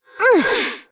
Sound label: Grunt Jump; paladins; Maeve